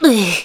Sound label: Die; Link's dying breath; zelda